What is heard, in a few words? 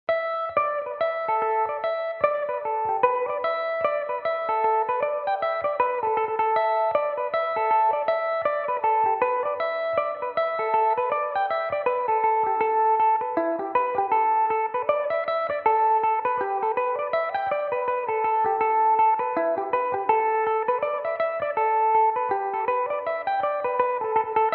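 A dorian, irish mandolin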